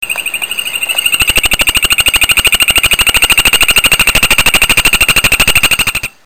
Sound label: P bicolor, chants